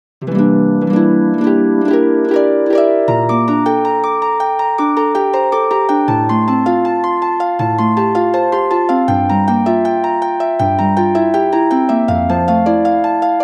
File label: Backing Track; Legend of Zelda OoT; Original key